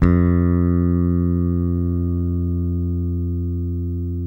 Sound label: Deep bass F 3; Spectrasonics; Bass Legends; DEEP-SIX